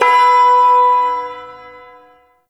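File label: Ersatz Shawzin Octave One B; Music; Warframe; Duviri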